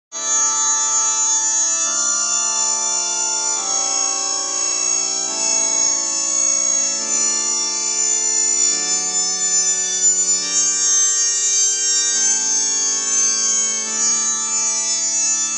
Soundtrack

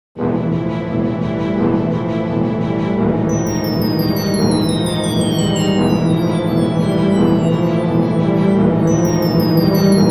Horror Zombie Splat Attack; Dreamscape OST

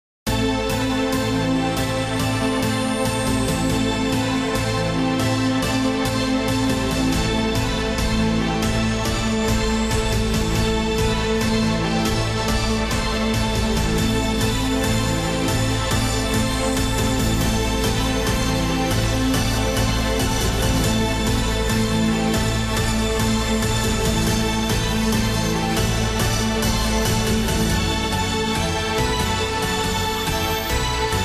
SciFi Soundtrack Space Adventure Music; space adventure ost

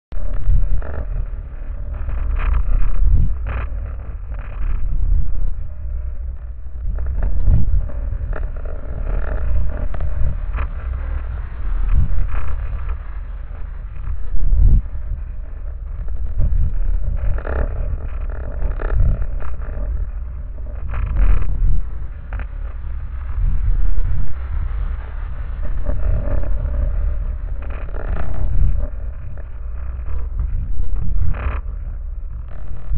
Screaming in Space Sound Effect

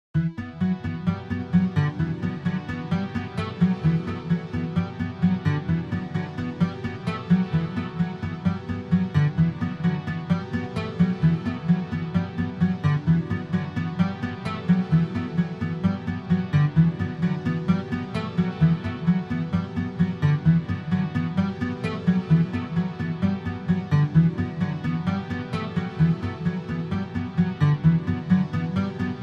Theme horns

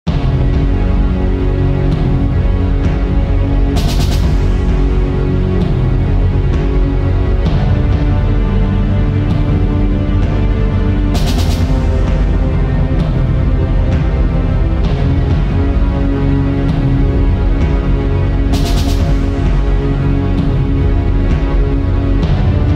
Sci Fi

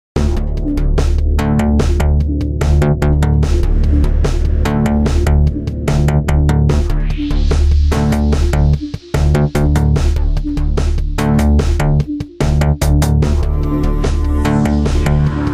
Thematic Music

